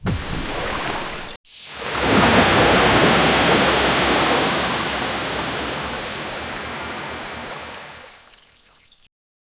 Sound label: water impact huge surges, ocean